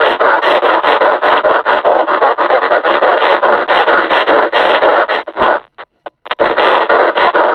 breathing, combine suppressor

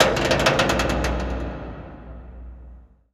stuck creak, crane; Half Life Alyx; chasm

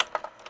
office projector slide; Counter Strike Model Pack; CS:GO

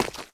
mud, footsteps

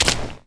fs fm paint, footsteps; player; Portal 2

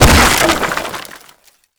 break wood crate ammo; physics; breaking

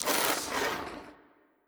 zombie scratch, brickwall

